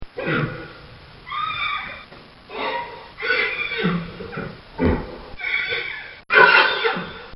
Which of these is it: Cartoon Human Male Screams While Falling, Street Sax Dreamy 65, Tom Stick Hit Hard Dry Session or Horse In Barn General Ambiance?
Horse In Barn General Ambiance